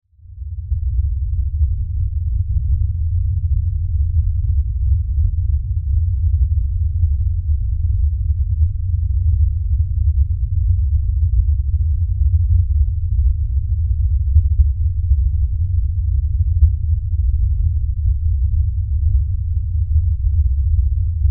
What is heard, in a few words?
LFE Rumble Drone; Rumbles; BlastWave